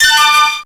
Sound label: Coin; VirtuaFighter